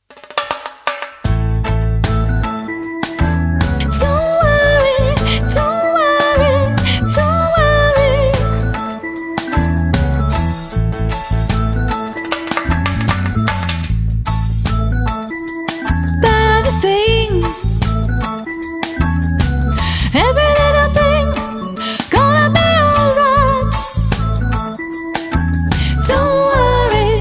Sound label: Soprano